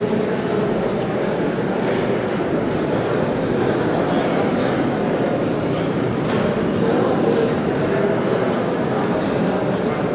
public places theatre audience chatter walla intermission; felix blume